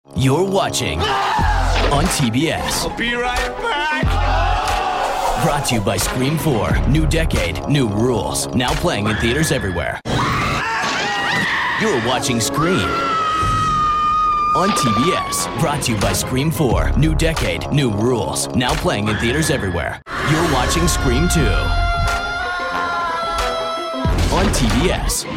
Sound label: scream; tbs